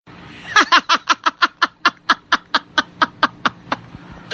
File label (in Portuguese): risada